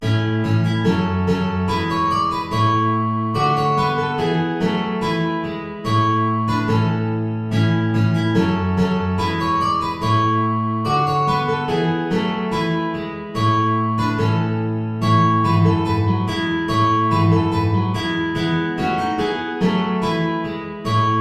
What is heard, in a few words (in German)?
4 Gitarren; Bransle de la Royne